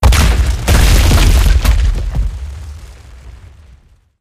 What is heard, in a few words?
random destruction; Source Engine; Portal 2